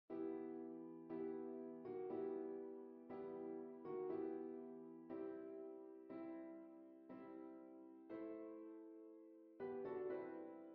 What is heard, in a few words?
Piano; Bella Ciao